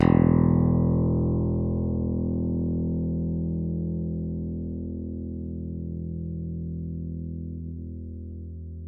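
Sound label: Yamaha open, Bass; Masterbits CLIMAX 7; PATITUCCI BS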